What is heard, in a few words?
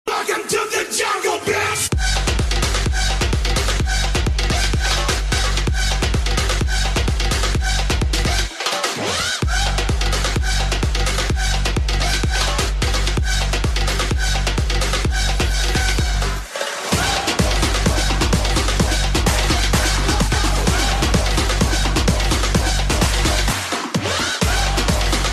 jungle beast beat ringtone